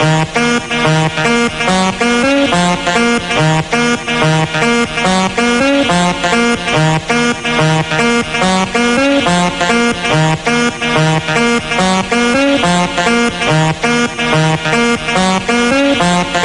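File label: Trumpet and Beat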